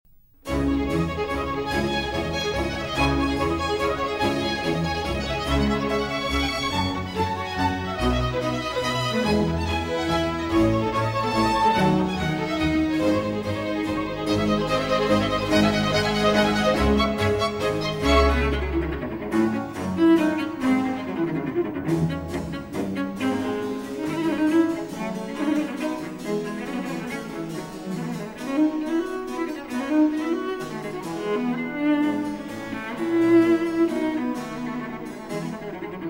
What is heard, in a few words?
Cello Concerto in D Minor; Vivaldi; RV 406; 3. Allegro